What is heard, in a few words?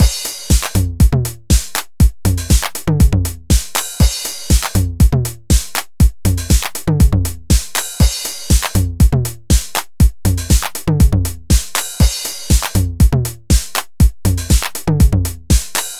TR 909; D16 Drumazon